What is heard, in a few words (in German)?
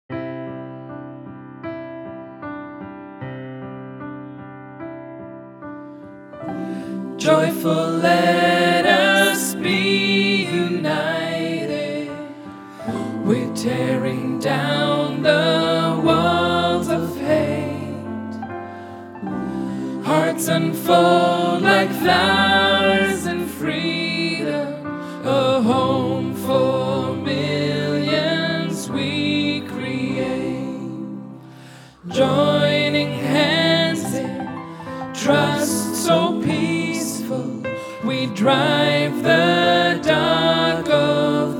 Playback Tonspur